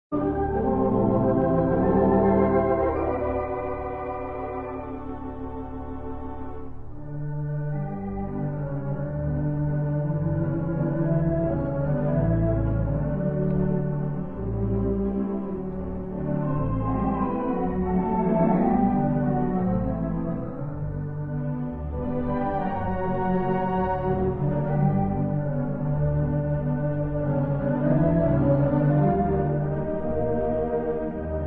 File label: theatre organ